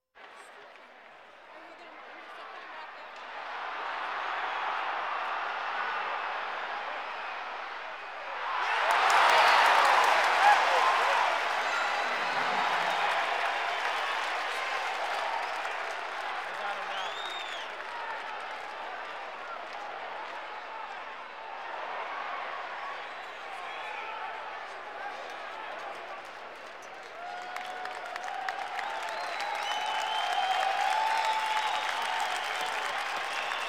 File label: Sports crowds